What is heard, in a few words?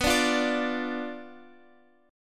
B Diminished B down Guitar Open F; Bdim strummed